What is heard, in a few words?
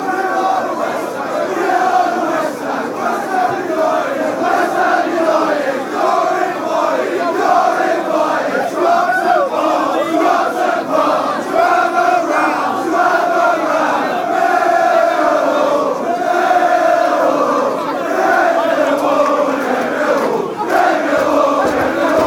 fanchants